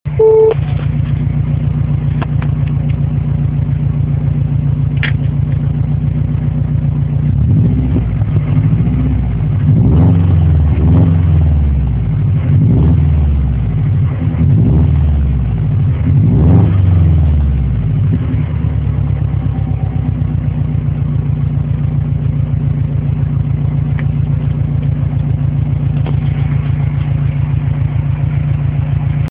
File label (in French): Accel SUB Inox OMP, 106 XSi; Sub GM